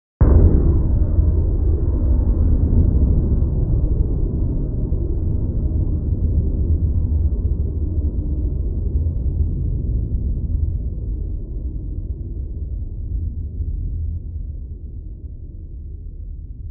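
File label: Impact Metallic Clang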